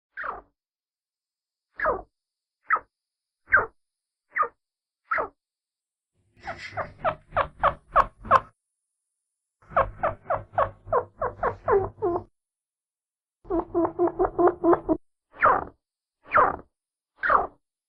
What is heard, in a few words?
Chinchilla; چینچیلا